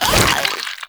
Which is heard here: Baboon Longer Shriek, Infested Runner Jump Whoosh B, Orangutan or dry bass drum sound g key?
Infested Runner Jump Whoosh B